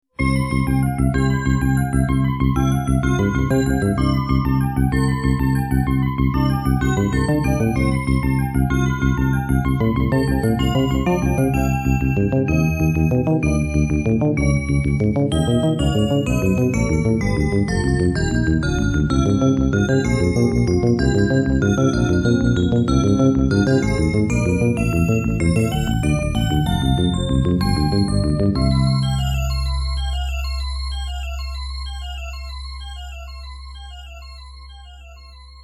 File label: All Patern Clear ~ Game Over; Salamander Original Sound of